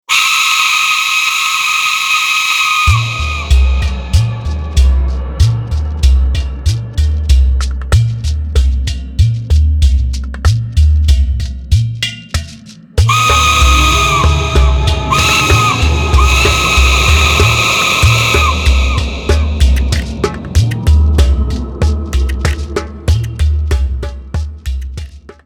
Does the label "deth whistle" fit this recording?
Yes